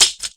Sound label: lock, Sound Effects